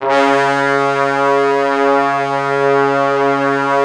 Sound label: C#2, Orchestral Brass; Best Service ProSamples vol.20; AKAI